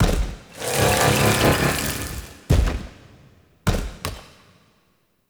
Idle Noble Bow; Warframe; Qorvex; Idles

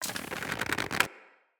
Bow Charge; Weapons; Player; Bows; DendritBow

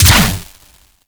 Rifle Proj Explosion; Wisp

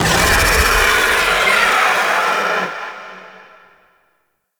Dragon Pelt Scream

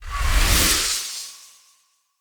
Blood Lust Cast, Powers; Fey; Champion

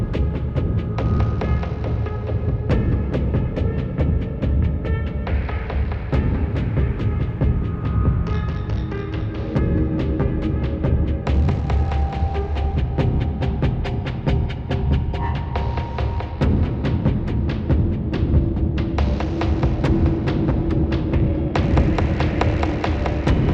Merchant Missions Loop; Hubs; Veilbreaker

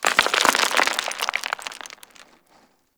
rock slide medium; Ambience; Warframe; Eidolon Plains

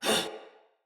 Surprised, Soldier Female; Battle; Barks; Dialog; Sinecure Soldier Female A

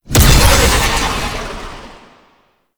Knight Plunge Ability Cast; Warframe; Tau; Tau Dax; Abilities

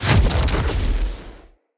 Casting Device Impact Damage; Weapon Impacts; Casting Devices; Fenn

